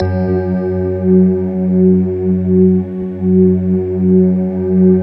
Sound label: bell choir, slow bell choir; Optical Media International - Sonic Images Library